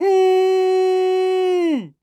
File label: Human Male Scream With Hand Over Mouth